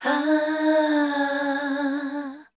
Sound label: #D, Voice Spectral; MELODIC; Best Service; Voice Spectral II